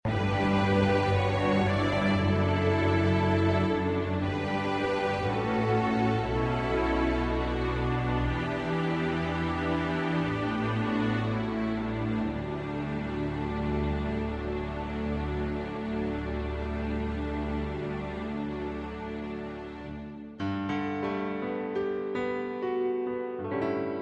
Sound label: Love Theme Strings and Piano